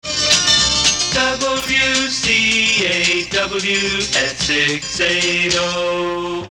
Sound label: jingle At six eight oh; Station Identification